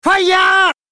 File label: hiyaa